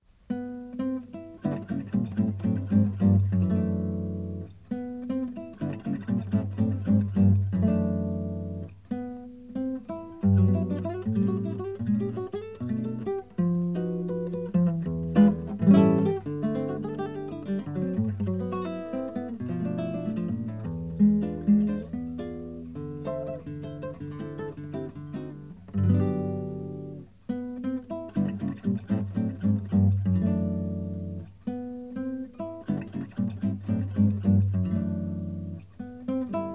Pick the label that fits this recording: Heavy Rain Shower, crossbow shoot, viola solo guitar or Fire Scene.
viola solo guitar